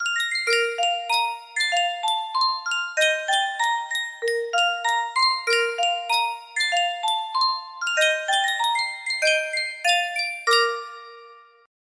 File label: Sankyo music box